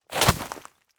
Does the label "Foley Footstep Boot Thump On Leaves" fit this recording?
Yes